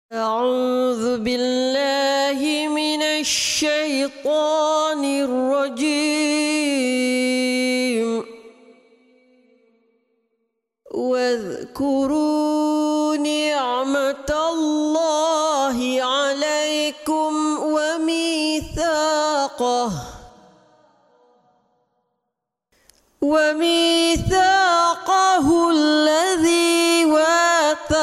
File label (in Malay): Surah Al Maidah (ayat 7); Quran